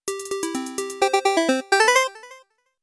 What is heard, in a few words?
Jingle; Rejected